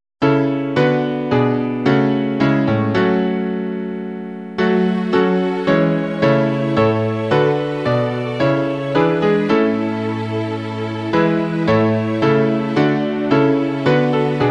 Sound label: backing, backing tracks; o come o come emmanuel